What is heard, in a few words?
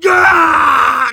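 Sniper pain critical death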